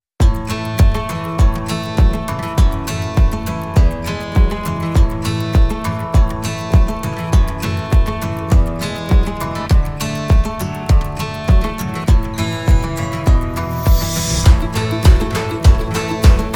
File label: Summer Countryside Acoustic Stomp